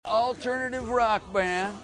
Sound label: alternative rock band